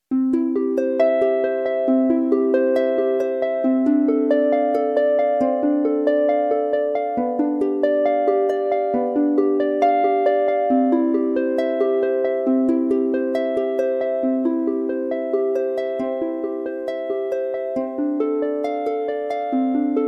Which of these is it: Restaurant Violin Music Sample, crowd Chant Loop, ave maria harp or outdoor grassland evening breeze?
ave maria harp